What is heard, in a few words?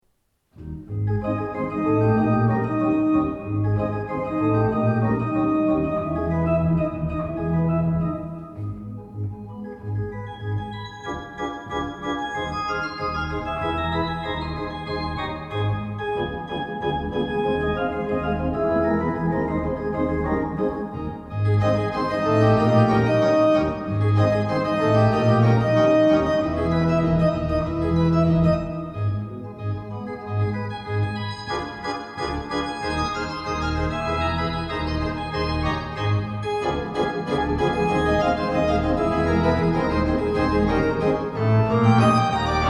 Maple Leaf Rag, organ